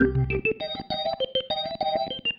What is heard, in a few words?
Pattern, Organ